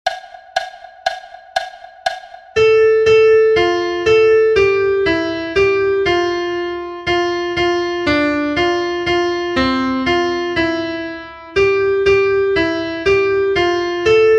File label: audio for melody; Blest Be the Tie That Binds